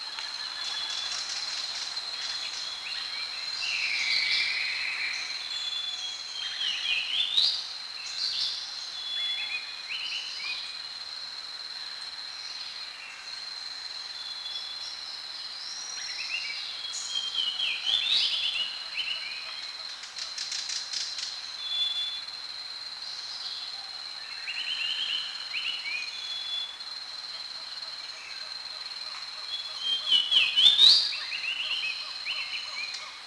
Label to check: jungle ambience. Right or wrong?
Right